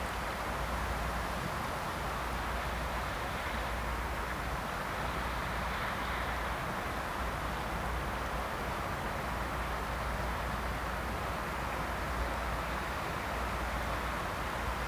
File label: ambient; World of Tanks